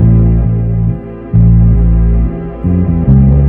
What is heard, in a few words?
bass, Loops